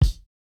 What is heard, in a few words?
kick, house